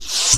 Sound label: gasp